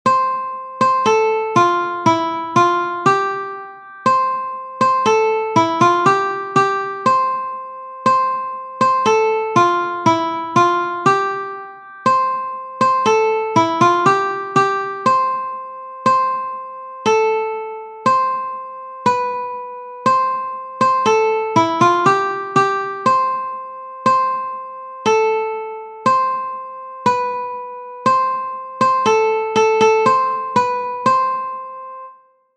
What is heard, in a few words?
Shepherd s Hey, Uke Normal Melody